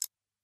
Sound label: bigwin counter; Great Book Of Magic Deluxe; Wazdan